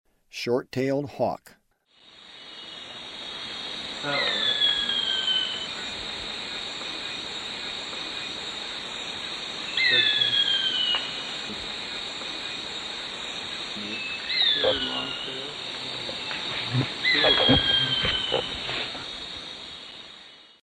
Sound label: Short-tailed Hawk, Birds of Ecuador; S. America